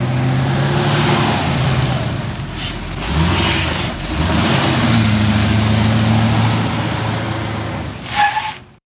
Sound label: Cadillac In Medium, Back Up Stop, Skid